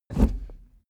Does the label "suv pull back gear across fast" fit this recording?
Yes